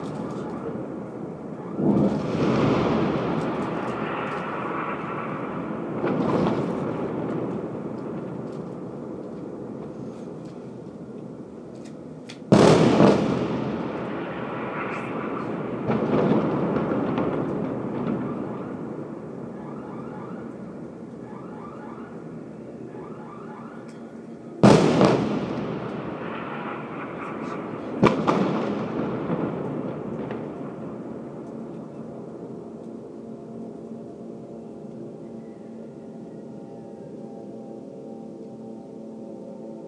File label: shocking explosions rock gaza